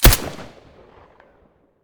silencer colt1911 mono

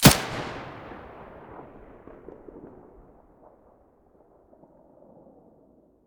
muzzle mono

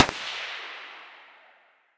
scar muzzle mono far

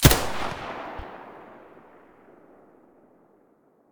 muzzle mp9 mono, weapons

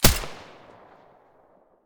mdr silencer mono, weapons

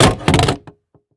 pistol drop wood; STALKER; IWP

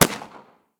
m1911 muzzle mono far, weapons; colt

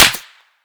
sonic snap 545, weapons; STALKER; IWP

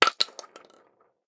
Shell 12ga wood; STALKER; IWP

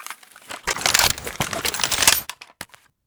reload, sv98

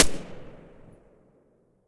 silencer g36 mono far

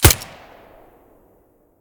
silencer fiveseven mono, weapons

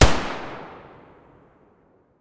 muzzle spas12 mono distant

